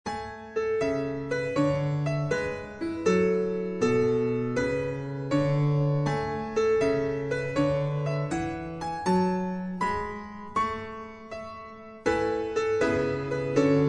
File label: Alarm Cloudy Background, 天气动态闹钟dynamic alarm; Xiaomi MIUI 12.5 China; 多云Cloudy; MIUI12.5系统音效全收录